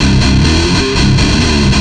Riff, Industrial, Nasty

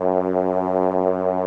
SOLINA, String Machines

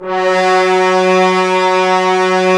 BRS BNSF2HR, Roland LA Composer, Tbns; 90 s Sample CDs

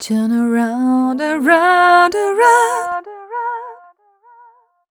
f mel c, fem Vocals

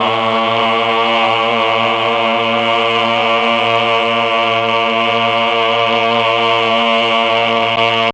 CHOR; ILIO; Propeller Island Legendary M400

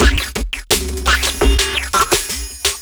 Beats, Synchro Funk; Junk; Sonic Foundry (Sony Creative Software)

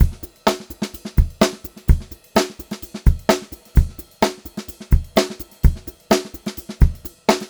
128 GR VAR, Funk Groove Drumloops; USB Soundscan vol.38; AKAI; 90 s Sample CDs; 128 GROOVY

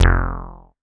bass F1, Roland MKS-80

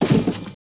DEST B IMP, DESTNBODY; Total Control - Action Cutz

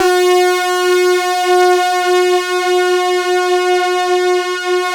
SYNC DEEP, PADS; Masterbits; Vintage Voltage

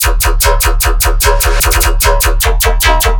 bass loop factory 150 D; Virtual Riot

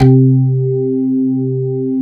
ORGAN, 135 BPM; Drum & Bass; Ueberschall; Drum & Bass Flash Trackz

